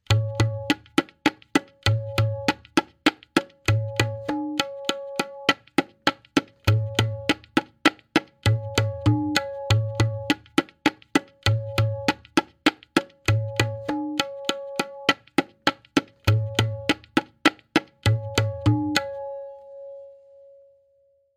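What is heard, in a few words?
Variation 3-Played, Benares Kaida 1, Tintal; tabla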